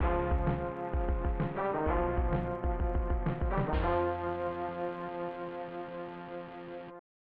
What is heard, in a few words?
Unknown Jingle; Turn and Burn: No-Fly Zone; SNES